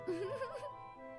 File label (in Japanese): うふふ; Flashpoint Ultimate 13; マシュマロ食べる